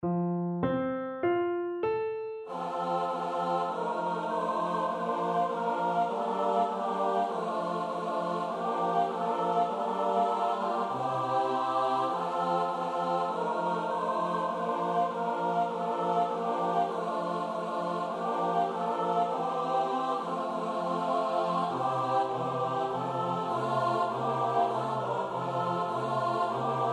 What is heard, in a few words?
Twards a World SATB STUDY